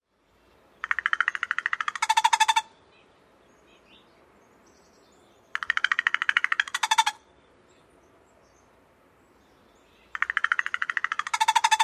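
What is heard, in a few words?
crow rattles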